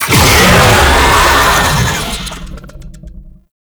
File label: Infested Vocal Player Spotted; Warframe